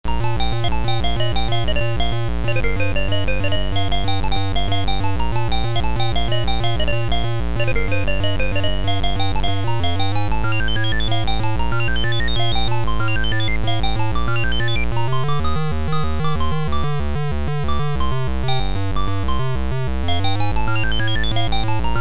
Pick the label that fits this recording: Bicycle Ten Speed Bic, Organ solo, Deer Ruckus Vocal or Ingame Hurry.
Ingame Hurry